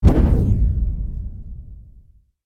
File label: teleport; vadim blink